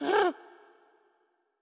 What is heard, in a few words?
Hurt; Going Under; Patella